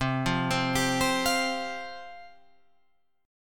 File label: C Suspended 4th C, Guitar Open F; Csus4 arpeggiated